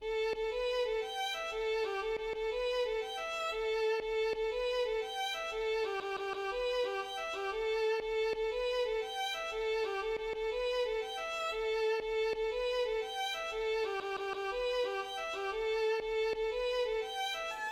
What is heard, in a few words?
Jig